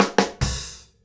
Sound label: KICK SNARE, Live Snares